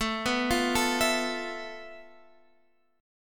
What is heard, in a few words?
F Major 7th Flat 5th A, guitar chord